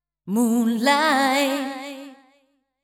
deep house female, 125, A, vocals

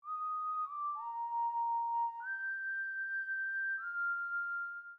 SYNTH 96 G; OLDSCHOOL; Keys

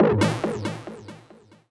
TECHNO EXTRA 140

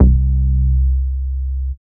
BASS MONO

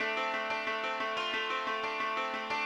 ETHNO 90 D

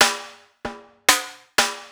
HOUSE FX 125

